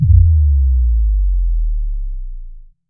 VFX2 Sub

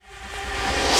Short Fills & Reverse; Vengeance Samples

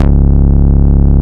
Vengeance Ultimate Bass C#, Root C#

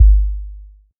Bass Drop, 808; Street Anthems Sound Kit

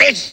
LoFi Vocals Oneshots; Vengeance Samples

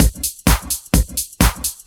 Housebeats, 128BPM; Vengeance Samples; VEH2 Loops

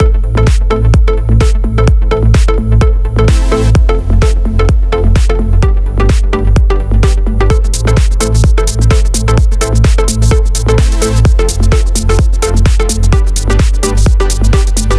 128BPM Mix; Vengeance Samples; Control Kit

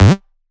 Synths G; Vengeance Samples

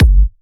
Kicks; Vengeance Samples

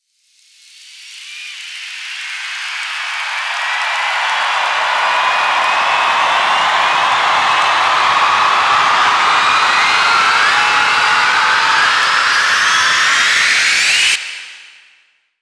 FX Uplifter

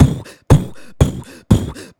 Beatbox Loops, Human Beatbox; Vengeance Samples; Special Sounds

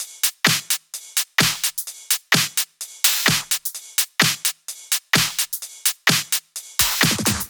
128BPM Kit; Vengeance Samples; Pitchford Kit

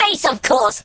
Vocal Phrases; Vengeance Samples; Vocals

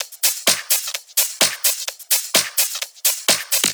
Houseloops 128BPM; Vengeance Samples

